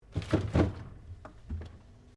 Bottle Plastic Place Fridge

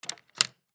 Clock Remove Batteries; Clocks; Foley; Blastwave

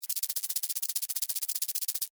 Insect, Wings, Movement, Shake, Rattle, Bug; Sound Effects:Animals:Insects

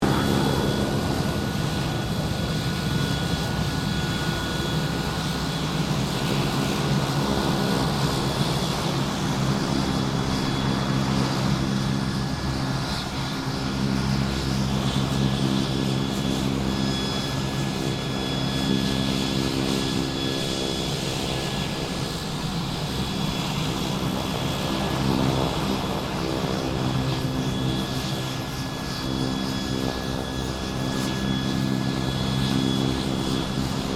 AIRCRAFT PC-9A SQUADRON 5 TAXI; Roulettes air display; Airplanes; Vehicles

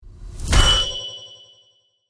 Power Up Button; Burst, Ascend, Accelerate, Production, Element, Imaging, Accent, Transition; BlastWave

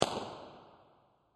Big Firecracker Bang; Fourth of July

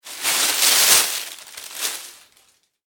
plastic bag rustle; Plastic Carrier Bag Rustle; foley; Materials; McKinney Sound

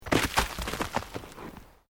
ROCK TUMBLING

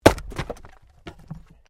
Wood Chopping Axe; Objects

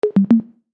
Chime Musical, Chime Musical Multimedia; Web Interactive; Chimes; Blastwave

